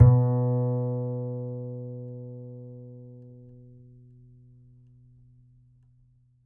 Upright Acoustic Bass; 1ST POSITION; Larry Seyer

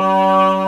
B3 G#1, organ; VOCAL ORGAN

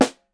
SN, Percussion

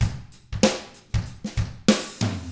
95 FUNK, Drums; ILIO; Double Platinum Drums 1

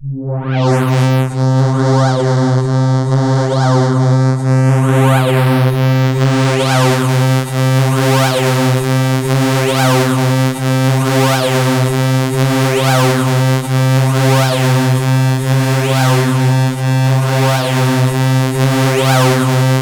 C3, Synth Line; 90 s Sample CDs; NiceBeats; SynthLine VOL-1

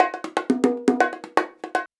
120 CONGA; Best Service Advanced Media Trax Modern Composer

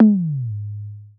GROOVE TOM, Drums Dance & Groove; USB Soundscan vol.11; AKAI; GROOV KIT

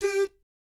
DOOT G4, Jazz; ILIO; Vocal Planet; Jazz & FX; JAZZCOMBO

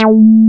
TEK A4, TEK BASS; Drums In Your FACE!

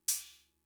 Cymbal; Fricke MFB-522

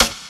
SD, Dance Mega Drums; Best Service

